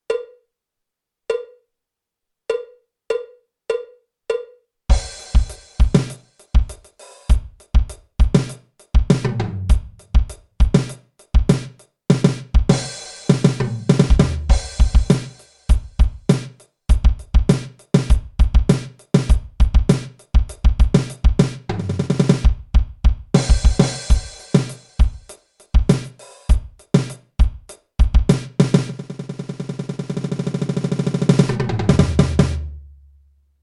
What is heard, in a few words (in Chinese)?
架子鼓独奏 爵士融合; 66条架子鼓独奏SOLO